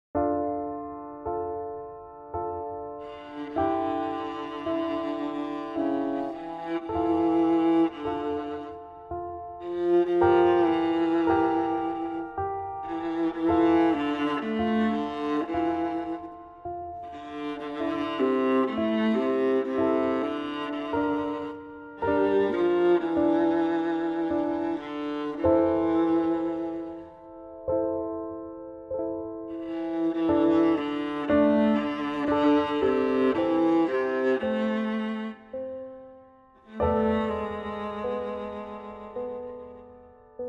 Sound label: piano alto